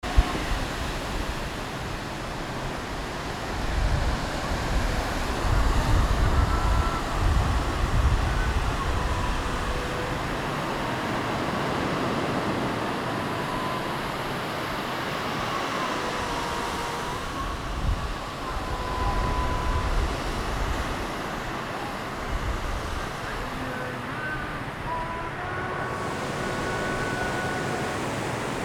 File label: rain street, Indonesia